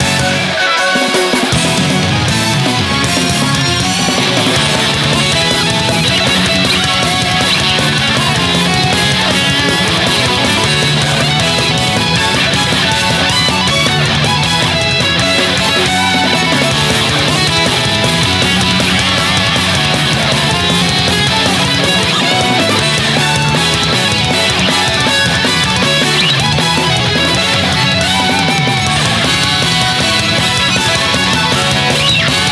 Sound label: Bgm Reach